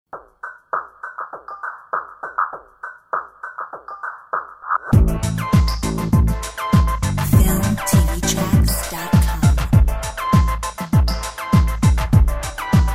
Technorchestra; Filmtv-tracks

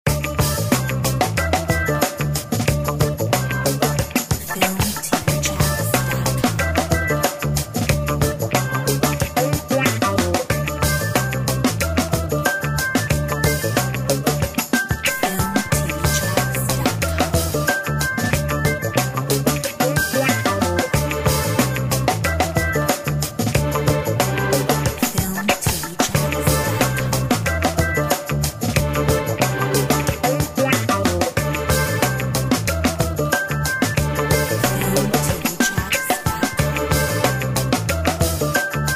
Funky Zombie

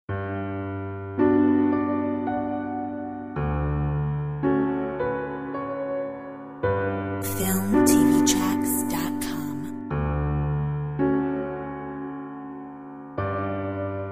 Impressionist piano

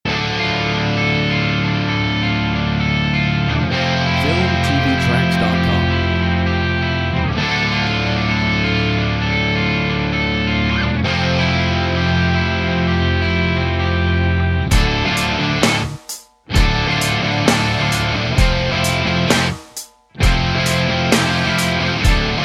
Battle Rock

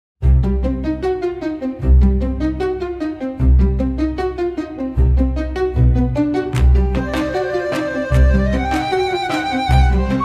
Cartoon comedy, Bright, Comedy, Magical, Playful; Comedy and funny; for films and videos

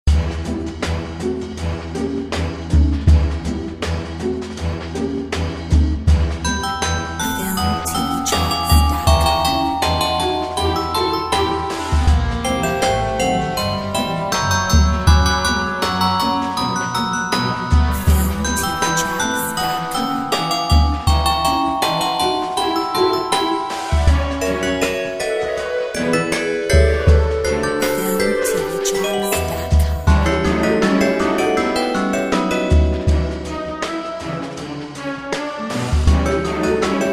Hip hop

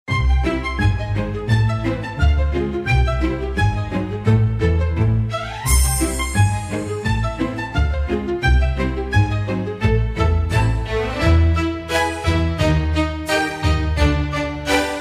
Happy Hop; comedy

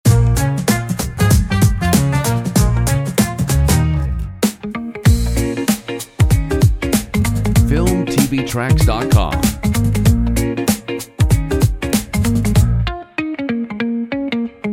BOSSA HIP HOP; comedy funny

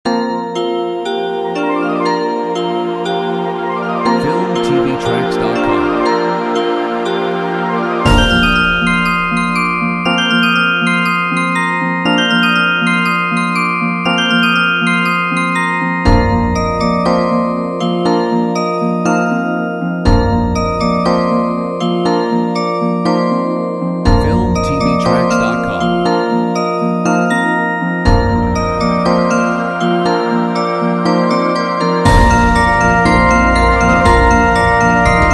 Christmas Bells

